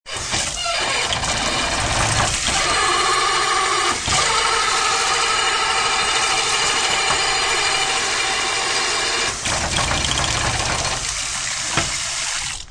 Busted Faucet, Broken faucet jerks and lunges terribly; Laundry; Sound Effects:Household